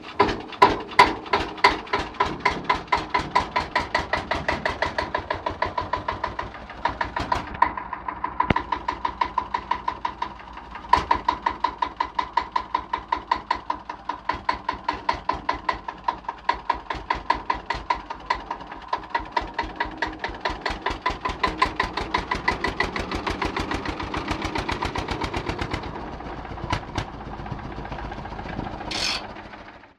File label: ringtone; 15er steyr